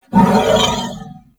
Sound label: Horse Vocal Screech; Vehicles; Warframe; Duviri